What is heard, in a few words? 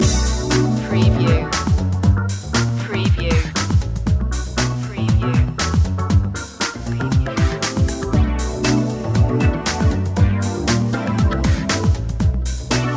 groove set loop